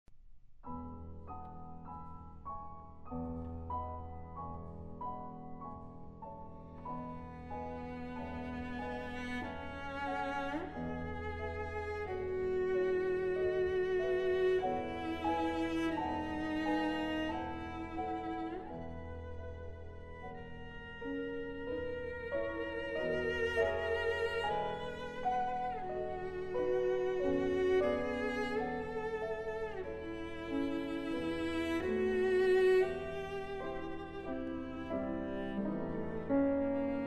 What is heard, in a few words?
cello son; dohnanyi